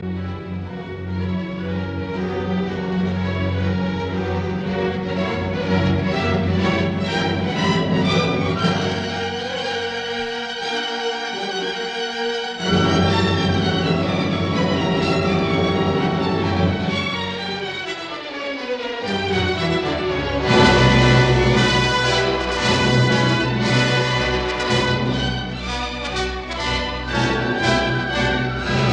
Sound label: Cello Concerto in B minor Op 104 B 191 I Allegro; Dvorak